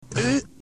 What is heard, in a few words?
ooo